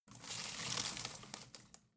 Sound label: home plant leaves rustle regular